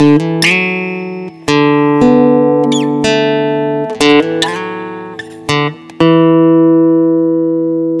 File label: Guitar 120 BPM (D Major), Acoustic Guitar; Desert; GhostHack; Free Guitar And Piano Samples